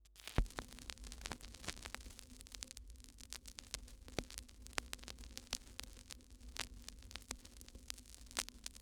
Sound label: Vinyl Crackles